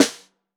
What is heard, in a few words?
Snare Bottom; Ableton Foundation FreePacks; Snare Designer